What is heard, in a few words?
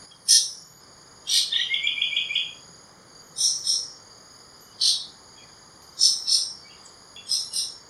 Large Woodshrike calls; Kledang Sayong Forest Reserve Ipoh Perak Malaysia